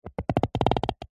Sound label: rubber short rub creak